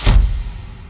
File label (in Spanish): impacto